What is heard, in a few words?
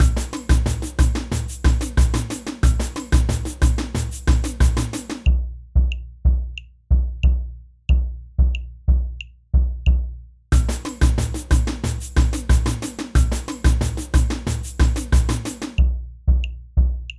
surdo, samba